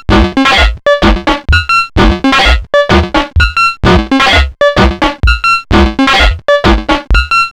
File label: SOMA ROAT Walking Groove